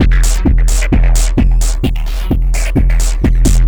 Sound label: Crushed Beat 130BPM; musicradar